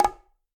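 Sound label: Bongo High Flam; Bongo A H Flam 3F; Latin Percussion; Drums; Drum Hits; Ableton; Factory Packs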